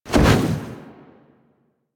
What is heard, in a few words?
Wall Latch Start, Foley; Warframe; Tenno